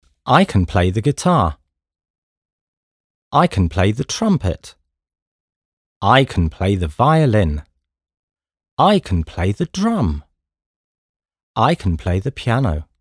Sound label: music